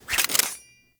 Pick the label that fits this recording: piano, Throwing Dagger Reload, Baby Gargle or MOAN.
Throwing Dagger Reload